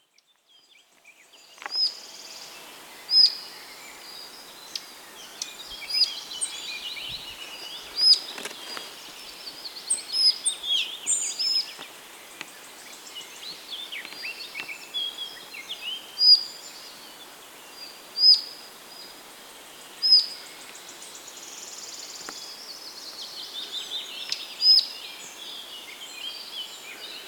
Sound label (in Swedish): halsbandsflugsnappare, fågelljud; Horns kungsgård 6 maj 2020